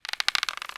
rope creak; WAR

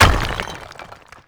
medium impact stone; WAR

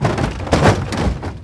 destroy debris; WAR

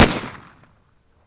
luger; WAR

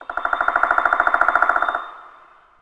woodpecker; WAR

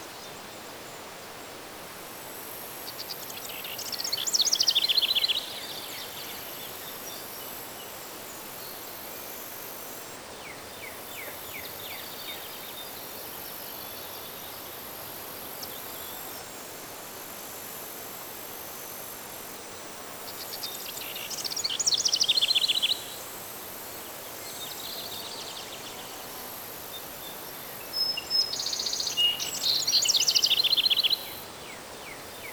day birds; WAR

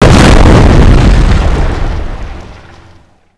small explosion; WAR

